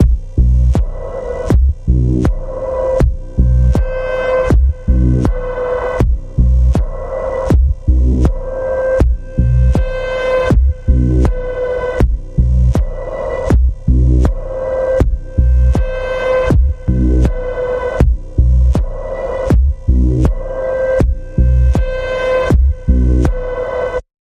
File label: Throbbing Pulse B, Key of B